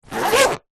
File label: household makeup bag thick zipper